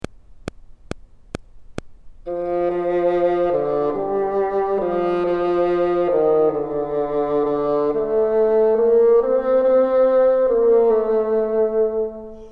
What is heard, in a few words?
bottom, bassoon